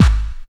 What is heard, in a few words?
combi drums